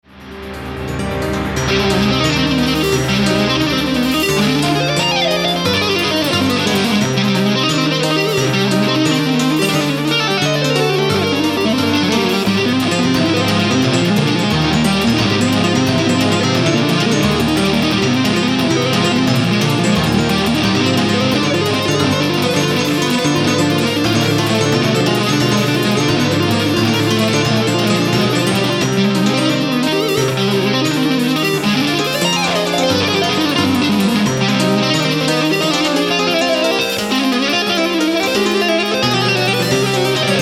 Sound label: Guitarists; Chopin